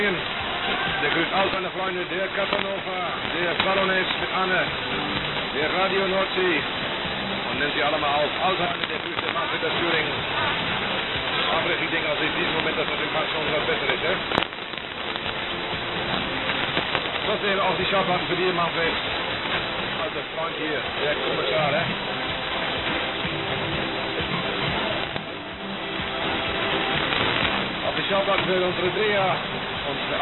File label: 1660 kHz; Titanic